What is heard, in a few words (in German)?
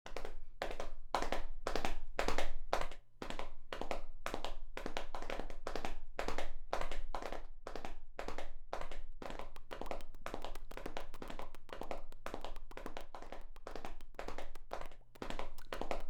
Surround